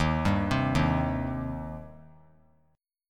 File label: Eb 6th Eb down; Eb6 arpeggiated; JGuitar